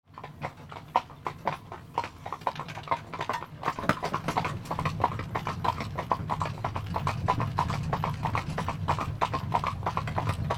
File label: horse carriage departing